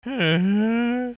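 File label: exclamation; vozglas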